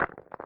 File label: Vent Move Ground; Among Us